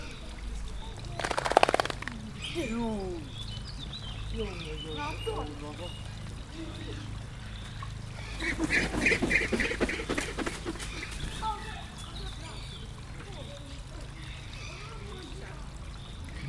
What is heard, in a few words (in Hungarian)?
iszik majd faraszall, marabu; debreceni zoo 2019